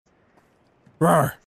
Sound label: Rawr; CaRtOoNz